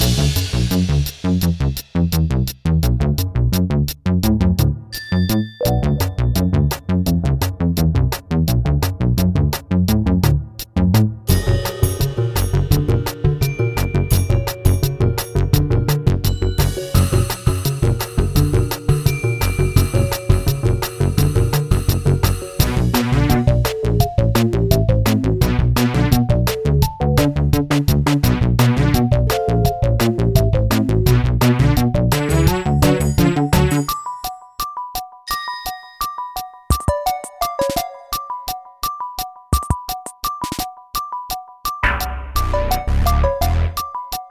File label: treasure yeti low